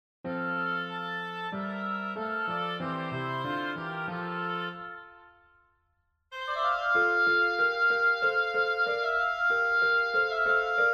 Konzert fur Oboe und orchester in F Dur; Erster Teil; Incipit

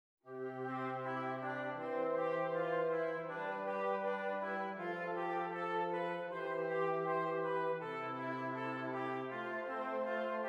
Stimmiger Contrapunkt; Ronge

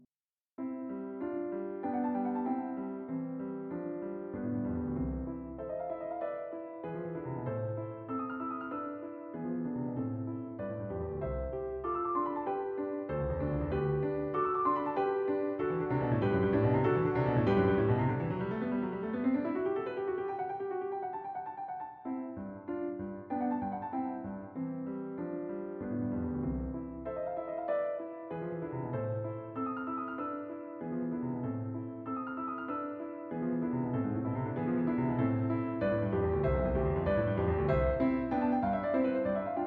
Bagatellen fur Klavier Andante con moto

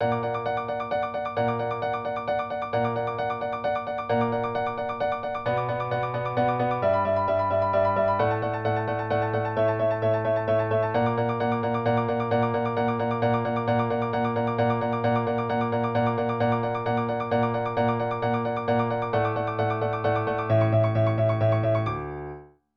Stuck in D Dur fur Klavier

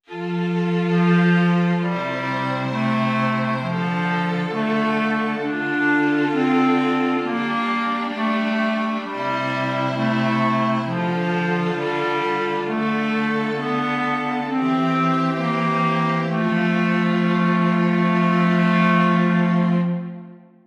Stimmiger Contrapunkt; Ronge

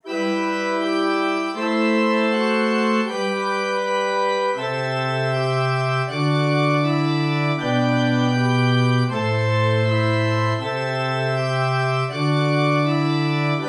Zwei simmiger Contrapunkt; Ronge

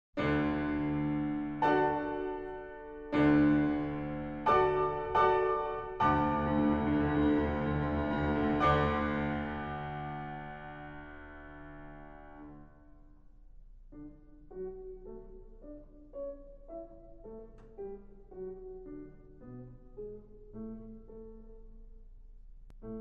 Klavierauszug; Hess 090 Die Geschopfe des Prometheus Ballett von Salvatore Vigano; 06 Maestoso andante

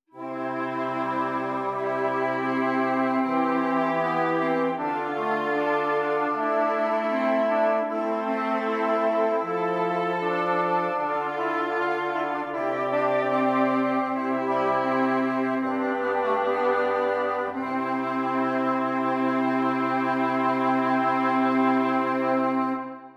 Stimmiger Contrapunkt; 5 Gattung; Ronge

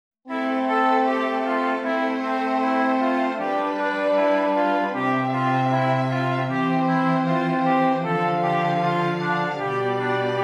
Stimmiger Contrapunkt; Ronge; corretto

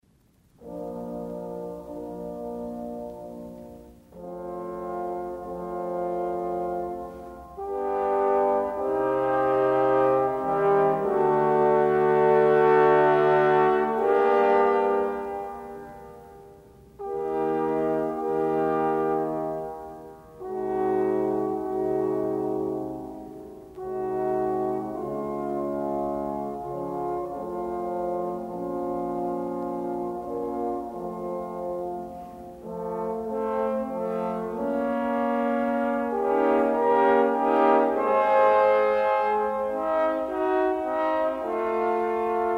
Equale für vier Posaunen D Dur Poco Adagio; Drei Equale

